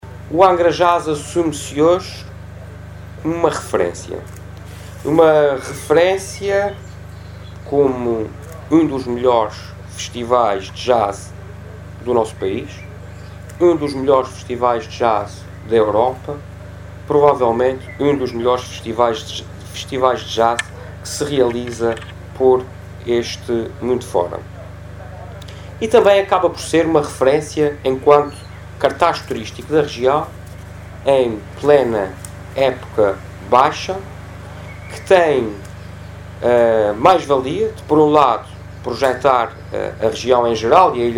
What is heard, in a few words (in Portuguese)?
Angra Jazz